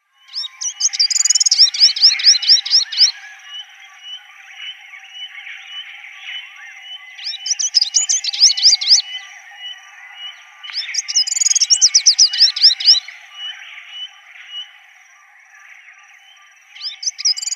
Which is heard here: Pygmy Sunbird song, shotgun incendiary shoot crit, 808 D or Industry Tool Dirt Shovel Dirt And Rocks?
Pygmy Sunbird song